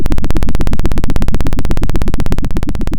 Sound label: Sound of a helicopter